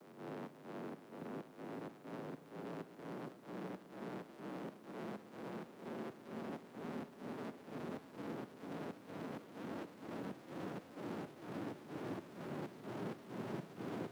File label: 128BPM Noise 32Bars Sidechain, Clean Noise Ramps; FX Fills; Vengeance Samples